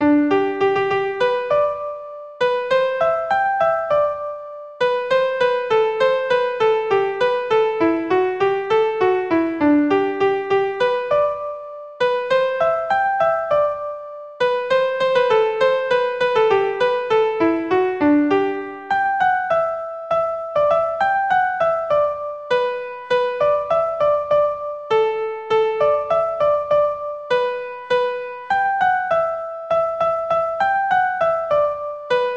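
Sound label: Redwing Polka, Traditional Irish Music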